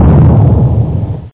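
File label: ship explosion; SecalDemo; Silly; Amiga 8-bit Sampled Voice; 8,363 sample rate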